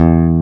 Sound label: E BASS E1, Bass Guitar, STACK BASS; AKAI S6000 CD-ROM - Volume 1